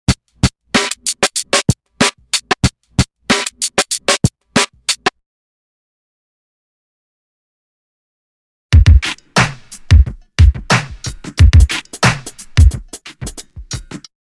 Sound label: Drum loop; BEST OF DRUM BREAKS 30